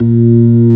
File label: ORG B3, organs; Sound & Vision; Gigapack I CD 2